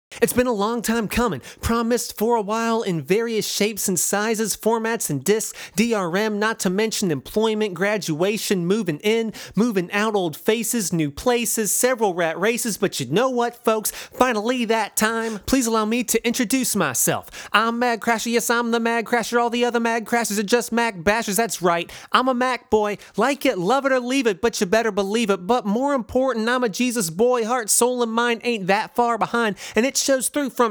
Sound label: verse lead